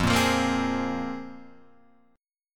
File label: Bb 7th Suspended 2nd F